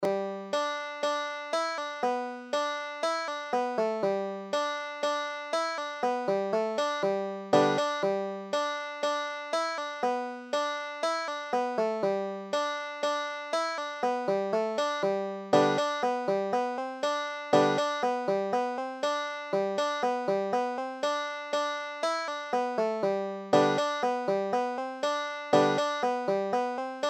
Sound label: banjo